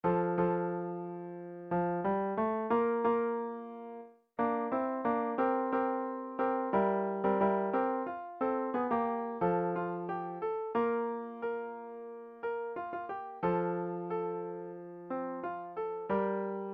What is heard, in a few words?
bass